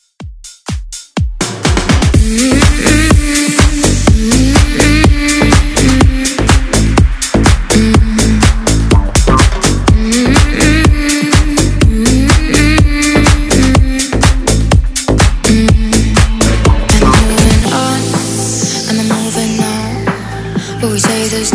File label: House Redrum